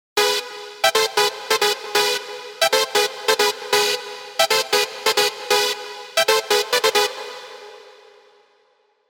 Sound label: Stab; alonso fireworks stand 1776 edition; serum2; Stab Thunder Clown